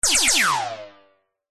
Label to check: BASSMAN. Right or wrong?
Wrong